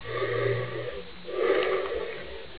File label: breathing